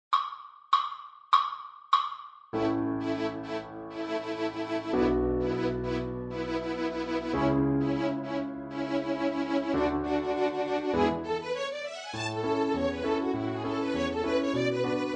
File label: Masons Apron high